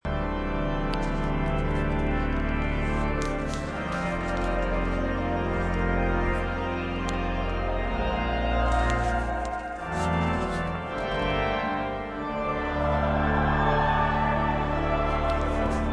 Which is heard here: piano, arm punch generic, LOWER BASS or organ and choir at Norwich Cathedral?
organ and choir at Norwich Cathedral